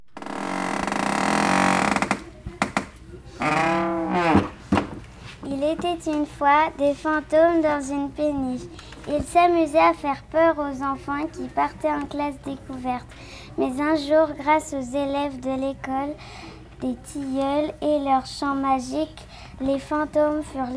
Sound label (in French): bateau